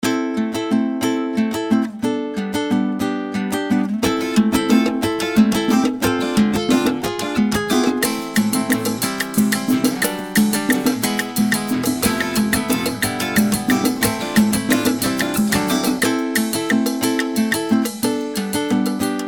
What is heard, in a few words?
Guitar, ringtones; Bluboo; S8